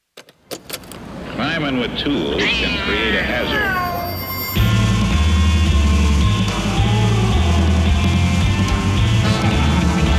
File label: bigband; Sound Voltex II Infinite Infection; yquartet